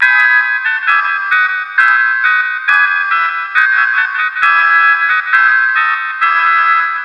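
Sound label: deck, horn; model 336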